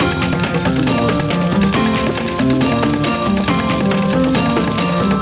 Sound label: Big Win Animation Loop; wild Krakatoa; Ingenuity Gaming